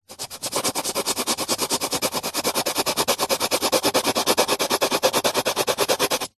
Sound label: office watercolour pencil colouring in shading paper